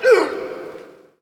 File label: Hockey Male Hurt; SFX Kit; Hockey Vocal Sounds